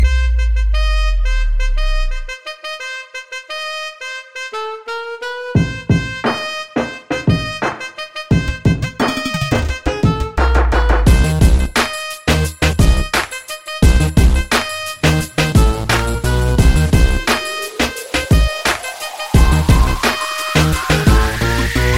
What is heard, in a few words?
tamil beat